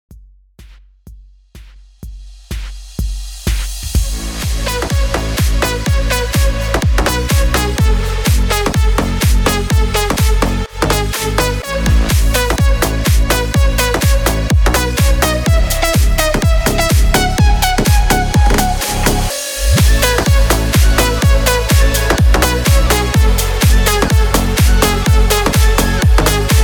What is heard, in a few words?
HOUSE MIX 125 BPM